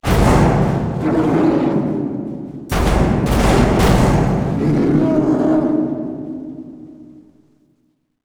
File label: supermutant spawn alt